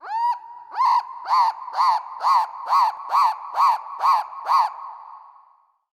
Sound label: Lagoon Rand Bird; moul